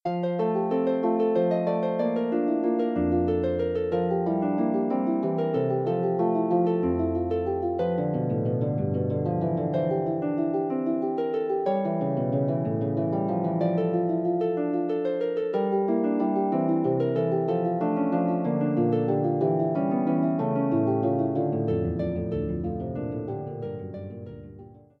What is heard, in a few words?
prelude, Harp